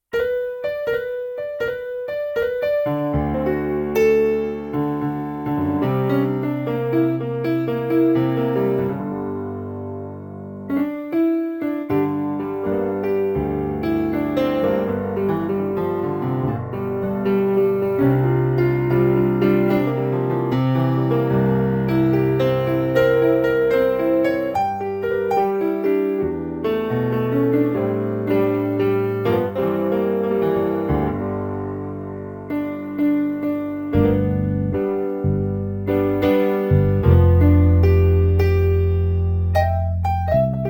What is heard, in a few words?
pc3 rock